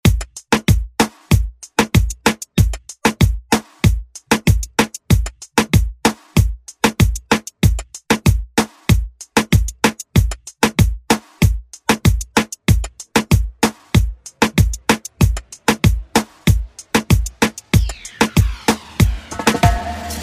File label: Moombahton Redrum